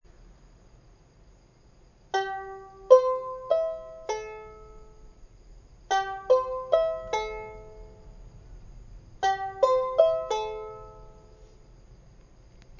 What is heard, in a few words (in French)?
Ukulele Polynesien